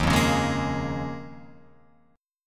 Eb 7th Suspended 2nd Suspended 4th Eb down Guitar Open F, Eb7sus2sus4 chord; strummed; JGuitar